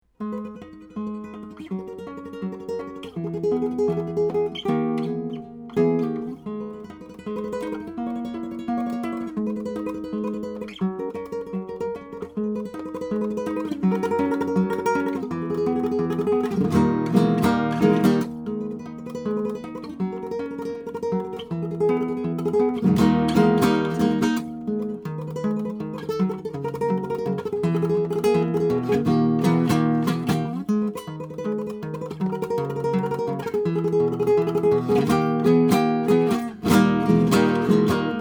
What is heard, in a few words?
Tremolo; Ramirez 1970F